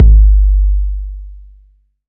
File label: 808's